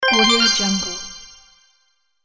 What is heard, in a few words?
bright right answer; cartoon